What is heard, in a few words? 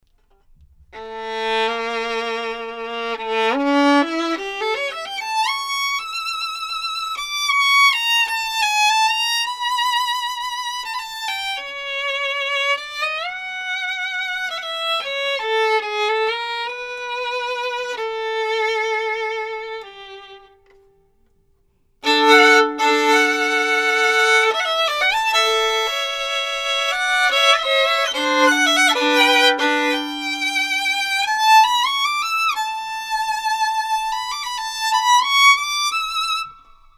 violin, professional